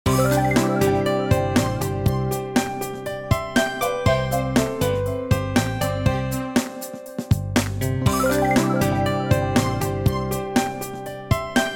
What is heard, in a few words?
Music change outfit